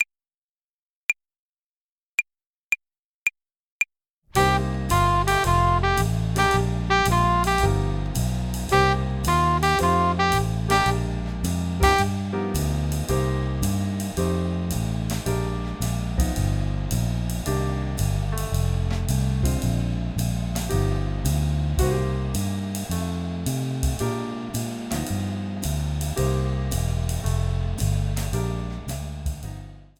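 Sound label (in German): Swing Phrasierung Übung; play along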